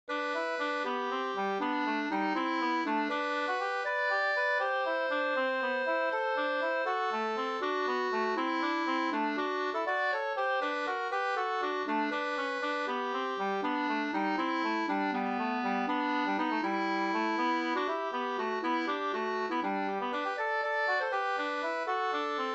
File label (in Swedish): folkdans vals; Sankt Bernhards vals 2st